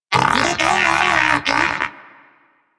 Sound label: Player Down, Moa Pet Tenacious; Warframe; Pets